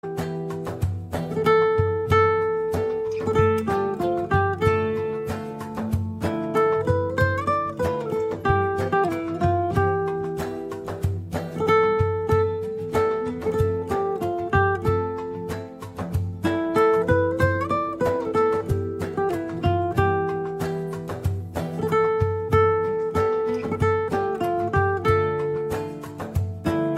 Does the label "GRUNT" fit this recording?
No